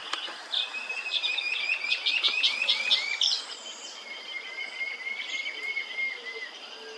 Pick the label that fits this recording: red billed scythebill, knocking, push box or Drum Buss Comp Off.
red billed scythebill